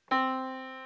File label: yamaha Disk